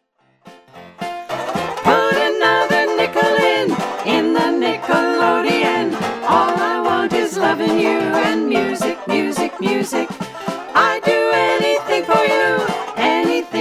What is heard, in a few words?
Music